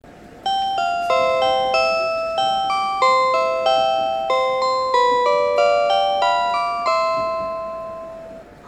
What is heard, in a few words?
chime; hokkaido